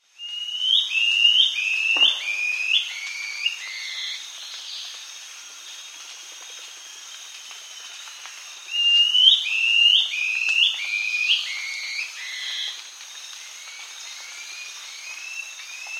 White-Throated Antbird; S. America

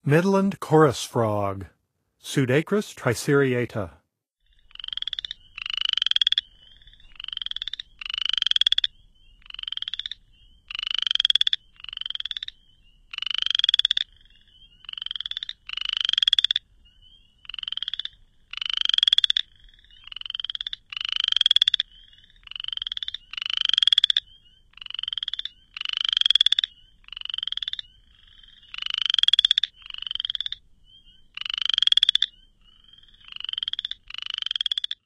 Midland Chorus Frog; North America; Songs of Frogs & Toads of North America

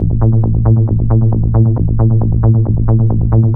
Synth, Techno

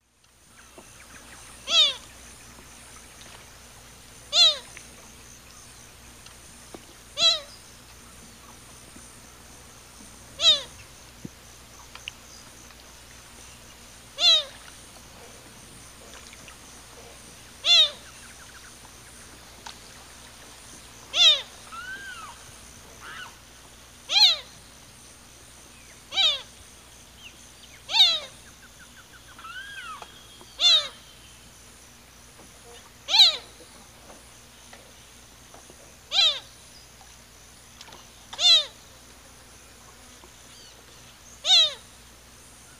Porphyrula flavirostris, calls; S. America; Eastern Lowlands

